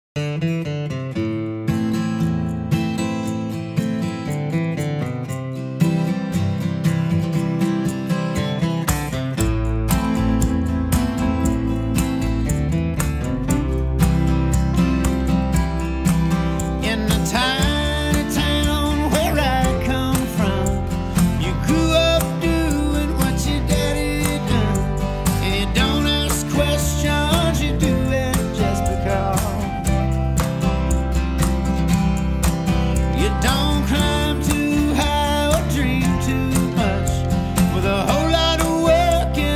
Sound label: acoustic